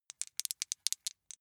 small two rattle in hand short, wuerfel

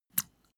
step barefoot wet light; menschen; schritte

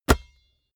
basketball catch hard

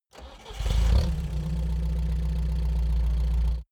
car uaz 469 start engine

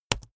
keyboard laptop enter press fast hard

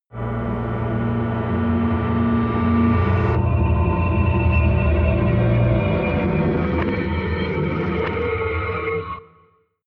reverse drone feedback, drones; musikelemente

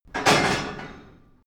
metal lock large close

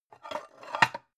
pan stack slide soft; objekte; topf

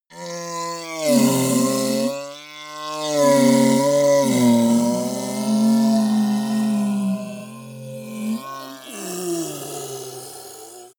ogre dying groan weak slow

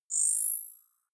zoom in sweep

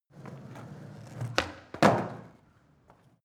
skateboard indoors jump; sport freizeit

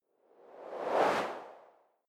whoosh simple incoming; swooshes-swishes

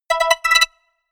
notification call; signale

